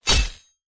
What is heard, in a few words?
sword